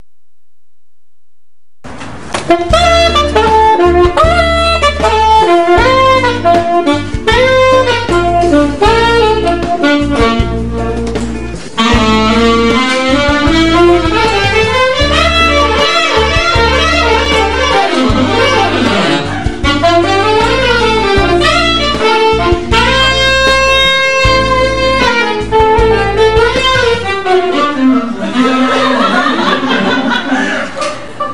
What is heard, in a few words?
Sax